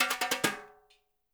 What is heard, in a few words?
BRUSH TIMB; Spectrasonics; Liquid Grooves 1 & 2